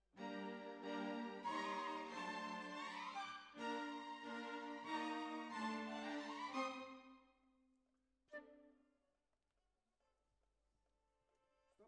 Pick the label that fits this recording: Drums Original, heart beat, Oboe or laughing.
Oboe